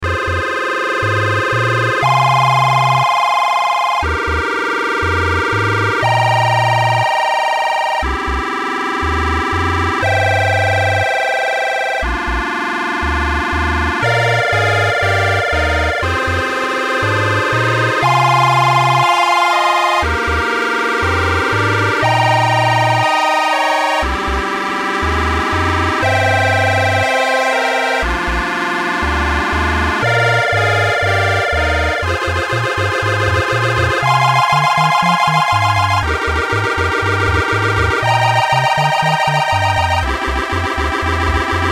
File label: chiptune